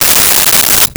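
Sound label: Ratchet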